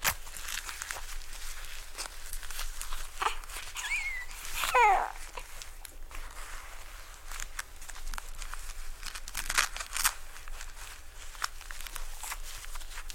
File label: changing, baby; CosmicRage